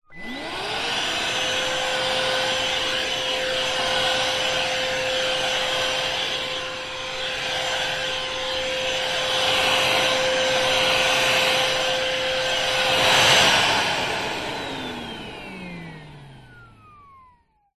household vacuum cleaner operate stick blade head end; vax; zapsplat